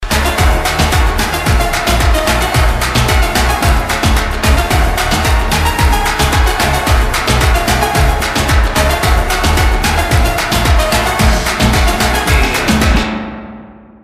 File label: instru